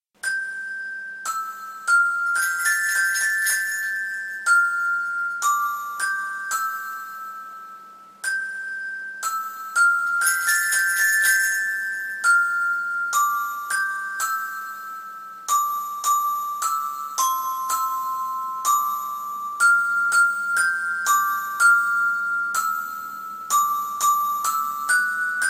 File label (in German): Klingglockchen Keytar